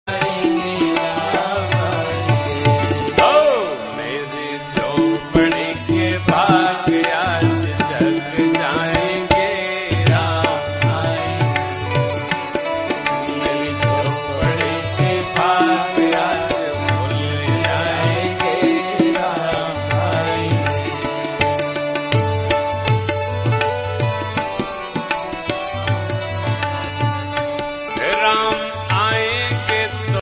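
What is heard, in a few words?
Male Version Ringtone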